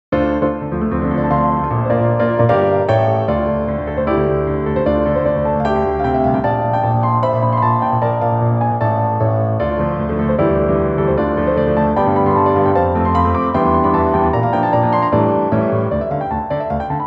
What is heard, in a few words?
Piano